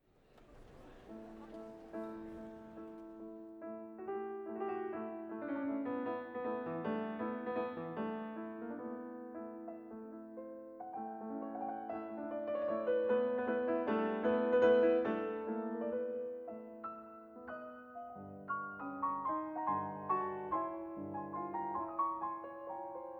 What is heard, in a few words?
Marzurka